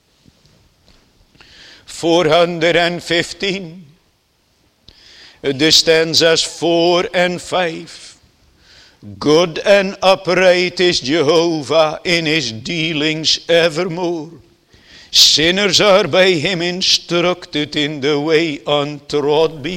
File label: Singing